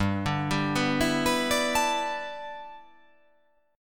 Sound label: G 6th Add 9th G, chord